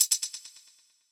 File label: Hihat Closed Echo